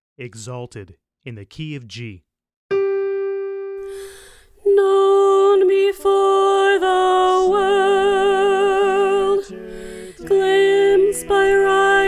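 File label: Soprano, choir part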